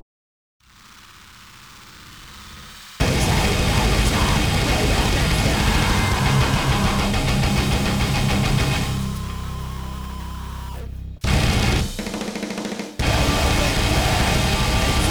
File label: iron bath